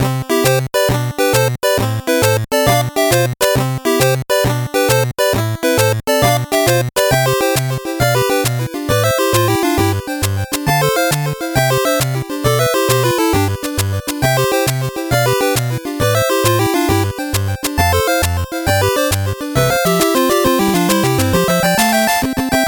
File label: 8bit bgm normal